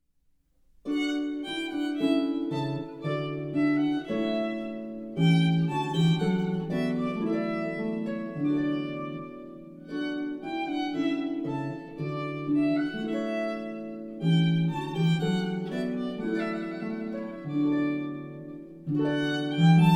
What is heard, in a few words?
with violin; handel